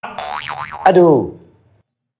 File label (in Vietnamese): Nhac Chuong Tin Nhan